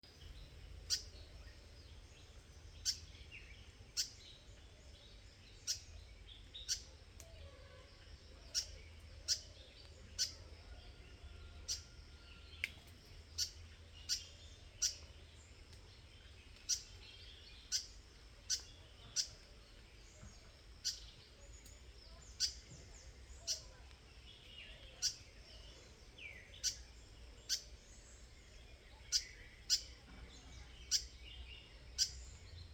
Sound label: fantail alarm; liana